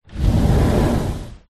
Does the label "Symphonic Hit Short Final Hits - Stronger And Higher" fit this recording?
No